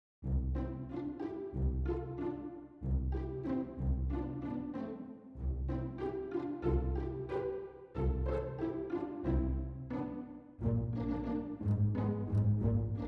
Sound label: Mystical Film Score Soundscape; Allude